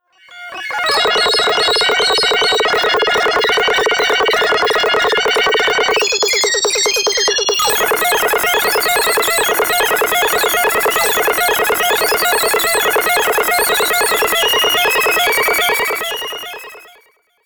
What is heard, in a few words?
Piano RPG